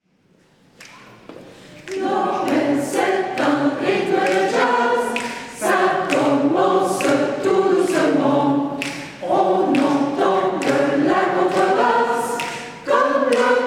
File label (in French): Noel Jazz